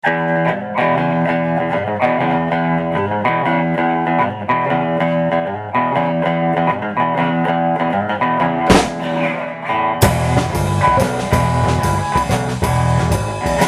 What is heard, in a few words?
Drums